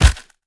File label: Collisions FS Slow; Lurker; Evolve; Gorgon